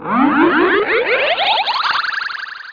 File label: Icon Swirly Woop; Let's Discover Michigan!